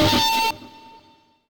feedback

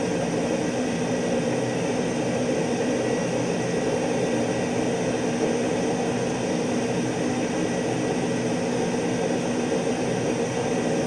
Su27 In He Amb L; DCS

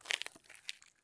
turn asphalt; Escape from Tarkov

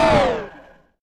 Flaps End; DCS

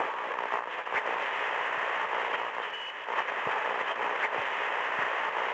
radio; World of Tanks Blitz

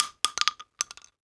shell bounce, weapons, shells; ModernWarfare

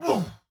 flinch light; Rust

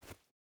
footstep hide dirt jump start; Rust

